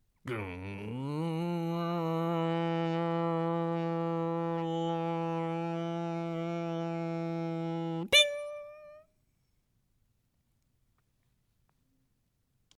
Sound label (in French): micro ondes en marche; Machine